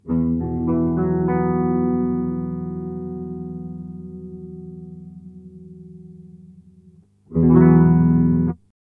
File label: Mooer Tender Octave X2 Sub Octave tone 12 min